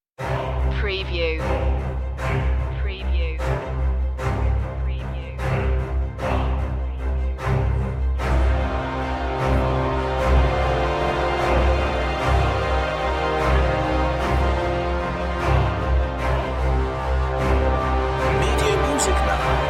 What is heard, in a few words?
no perc